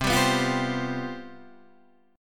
C Major 7th Suspended 4th, C, down, Guitar, Standard; strummed; CM7sus4